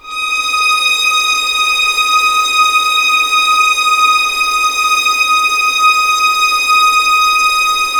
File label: D#6 LEG FVL R, The Ultimate String Collection; East West